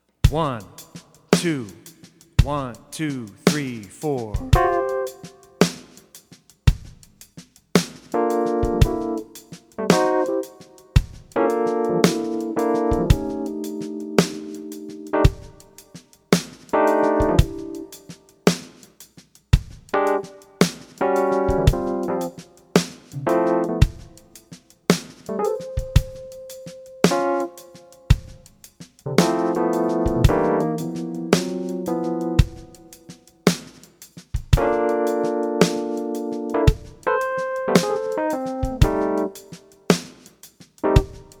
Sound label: C Blues Shuffle no bass